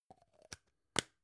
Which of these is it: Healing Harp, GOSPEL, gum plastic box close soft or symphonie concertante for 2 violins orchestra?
gum plastic box close soft